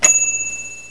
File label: ding